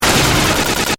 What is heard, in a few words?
Glitch; Glitches (Sound Effects:Sound Design); BlastWave